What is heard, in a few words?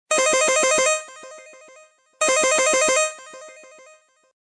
Ring; LG; CE110